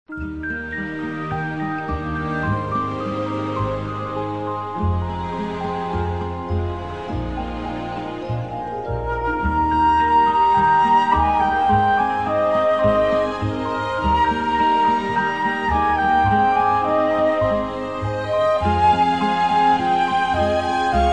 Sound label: Orchestra, Strings